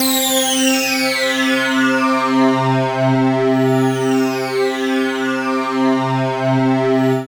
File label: single-synth, techno, c; Techno ID